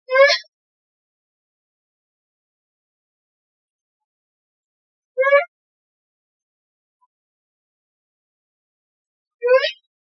squawk, owls; Marcot

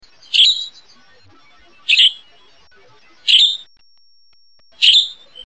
Dry, sharp notes: chi-tr-dip or chi-tr-dic; Call; In Klamath Mountains, typically a quick 3 syllables

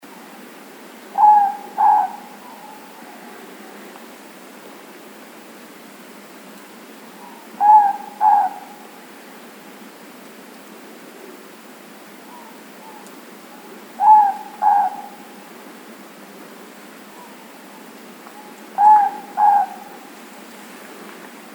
morepork, owls

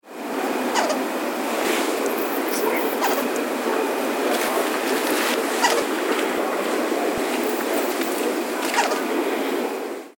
juv chitters

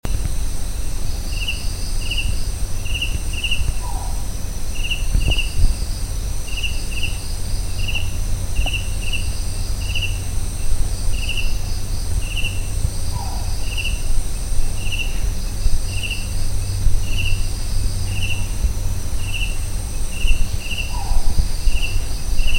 collared scops owl Otus lettia, Kaziranga

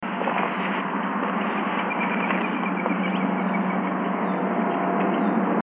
eurasian collared-dove wing whistle

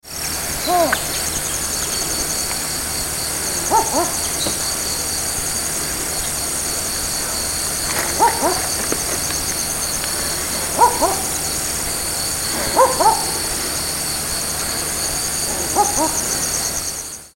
barking owl; marcot

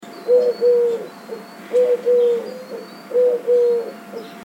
eurasian collared-dove

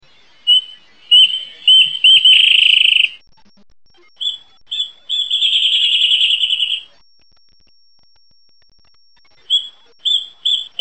WREN; FAMILY TROGLODYTIDAE; Song; heard year-round; staccato ringing notes on one pitch; starting deliberately and running into a trill. Yip--yip--yip-yip-yip-yip-ytr-tr-tr

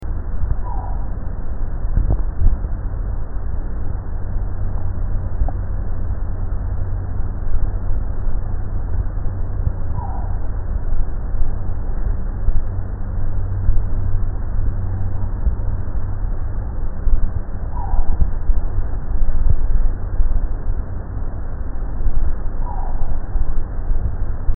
collared scops owl Otus lettia, Kaziranga

